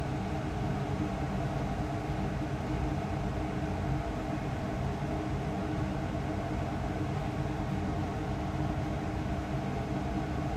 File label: Vent; The Joy of Creation Halloween